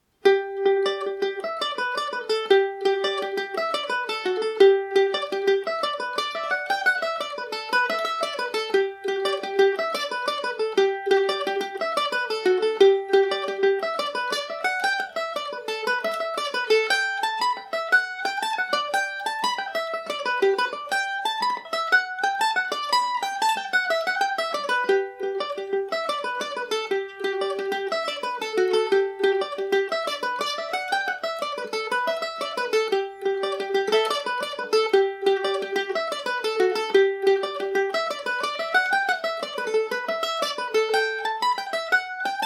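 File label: G major, irish mandolin; lost and found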